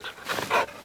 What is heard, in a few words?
Kubrow Tame Idle Sit Scratch Pant; Warframe